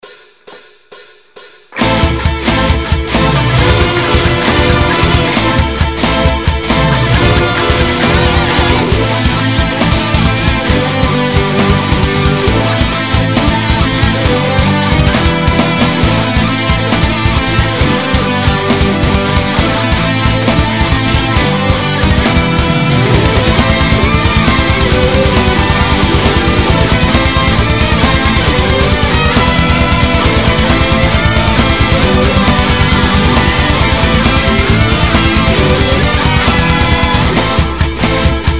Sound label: boss theme metal; sonic the hedgehog 2